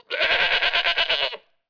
goat; Ambient; Zeus

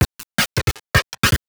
DRUMLOOP D+B 160, Drum+Bass Loop; eJay

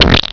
Squish; Macworld (1998-01); Games World; Shareware Games; Arcade; Greebles 1.0

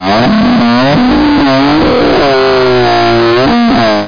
chef die

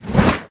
fireball; kult

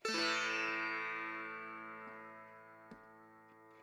SITAR ETHNO 125 A, Guitar; eJay

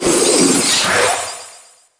octopus hit; DAoC; Toa; Gamestar

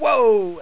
got letter; Hang2000 v1.1

